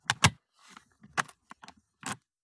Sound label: scooter trunk; soundbits-soundfx